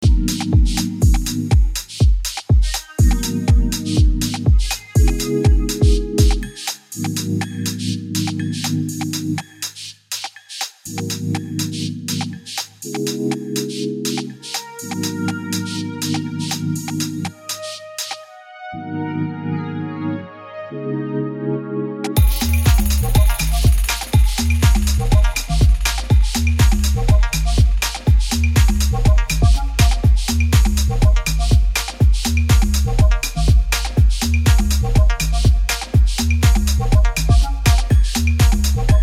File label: Warm Organic